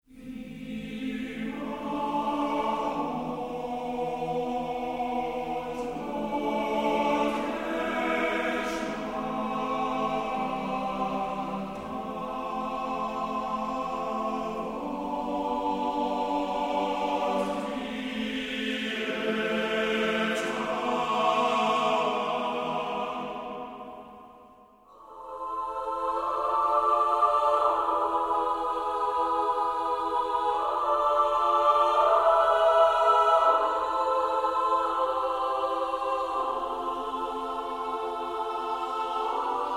Choir; Abbey Road

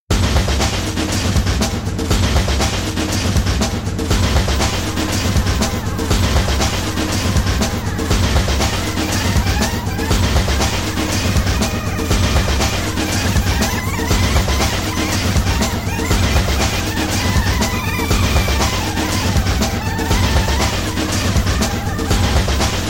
Instrumental

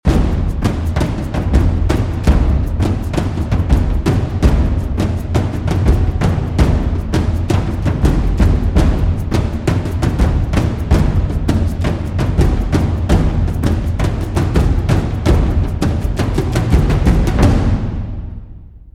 Drums; Abbey Road

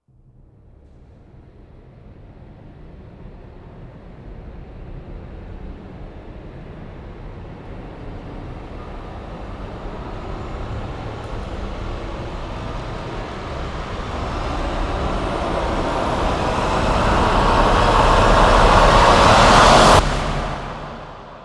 Orch & Choir Rise

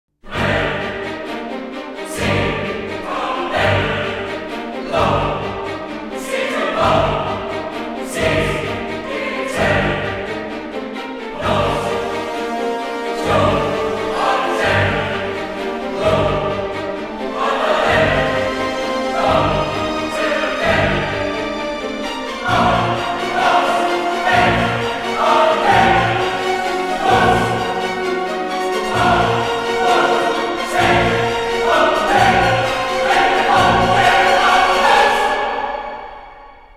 The Demon Code, Orchestra And Choir Only; трейлерная музыка

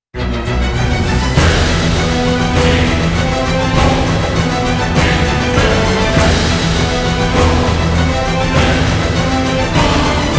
Orchestra and Choir

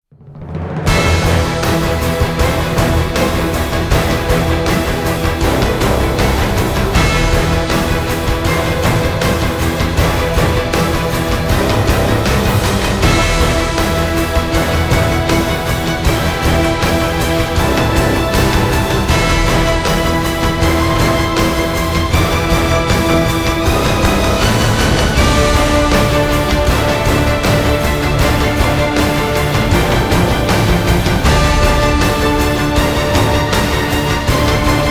(No Choir), Orchestra

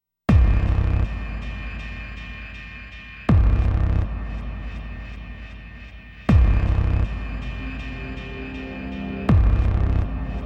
No Sub Bass

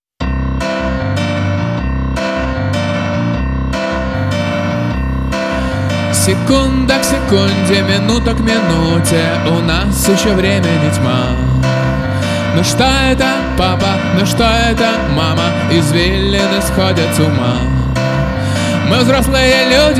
low-fi acoustic version

